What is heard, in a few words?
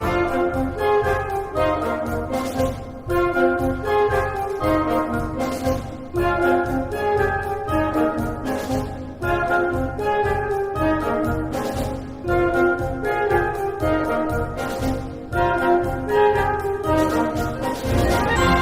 questioning allegro